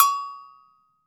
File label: AGOGO BELL; AKAI S-Series CD-ROM Sound Library VOL-5